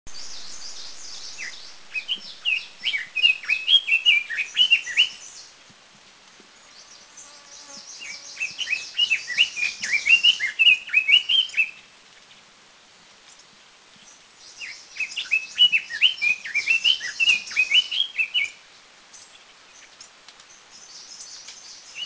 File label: Red-billed Leiothrix; Dirang; India